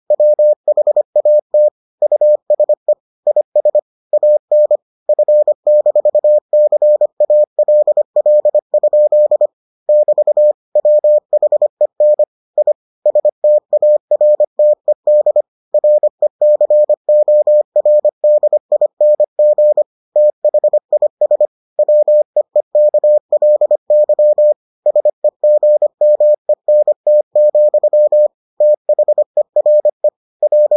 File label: morse